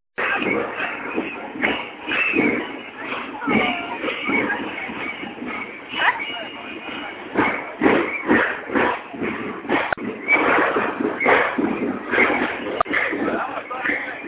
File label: samba; on thewave